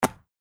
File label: football catching